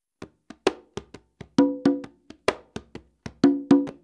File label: rumba swing, congas